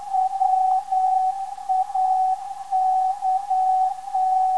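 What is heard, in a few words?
WAK 490kHz, non-directional beacon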